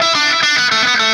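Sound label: Delay Wah, Guitar; Sonic Foundry (Sony Creative Software); Indie Rock Anthems; Session 02 - Clinical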